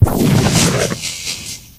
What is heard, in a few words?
scanner electric; Source Engine; video game; npc